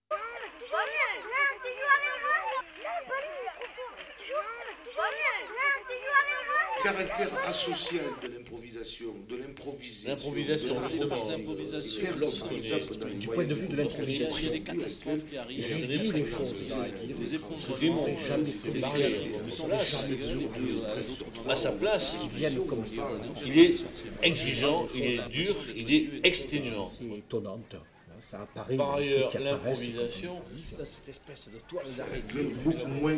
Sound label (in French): Question d improvisation